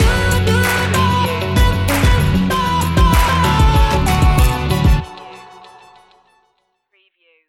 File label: full throttle stinger